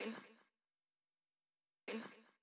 Loop FX, 128 BPM; VEC5 Drumloops; VEC5 Multi Drum Loop 28

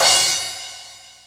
Korg N5 Rock Kit C#3

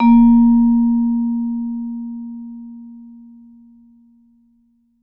VMED A#2, vibe med mf; Marimba & Vibes; Sampleheads; Dave Samuels

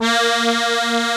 ZUPAN ZUG BB, AKKORDEON; Best Service; Alpine Volksmusik

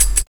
tambour, tambo shaker

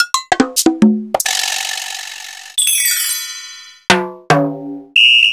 Akai MPC500

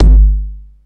Hum Kick; Drums; Kicks

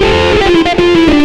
xtra lead

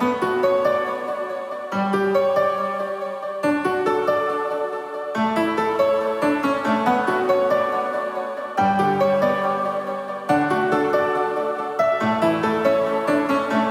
140BPM B; Vengeance - Essential Clubsounds Vol.4

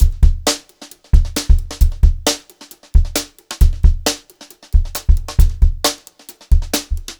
beat, Brushed Drum Loops; USB Soundscan vol.39; AKAI; 90 s Sample CDs; 133 HOTROD

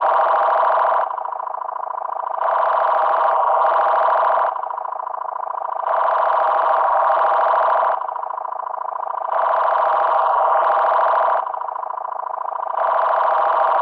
L R reversing Loop; radiosonics

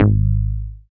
BAS FM DX-7

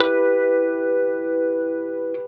GIT, Drum & Bass, 150 BPM; Ueberschall; Drum & Bass Flash Trackz

